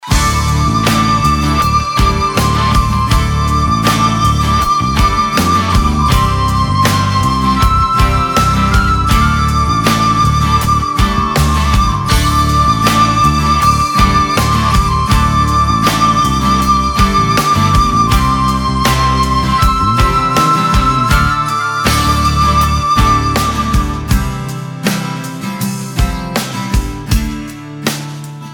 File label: Ethnorockballad